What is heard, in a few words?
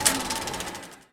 35mm film projector stopping